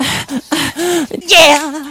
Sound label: idle, hehe; skibidi toilet epsiode69